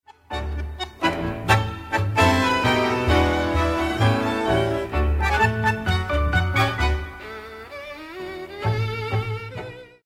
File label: aerophone